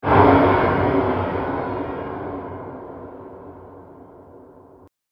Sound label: Dark Fantasia Transition; Scary Soundscape Sound Effects; fantasy sound effect